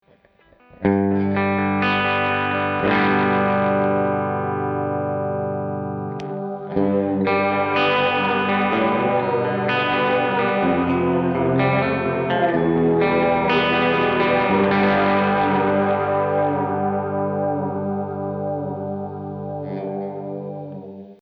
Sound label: ab modulation